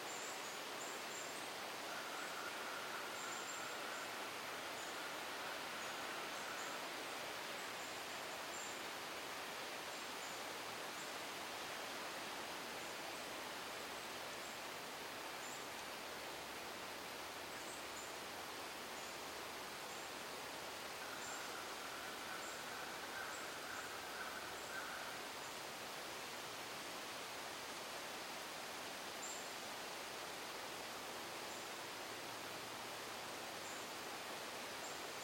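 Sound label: distant sparse not loud birdsong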